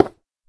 place block

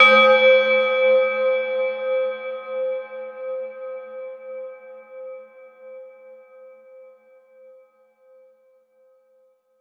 warning bell; ff anticitizen

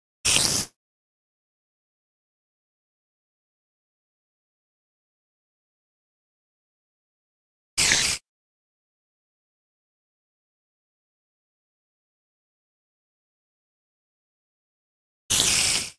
spider idle

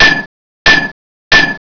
hammer

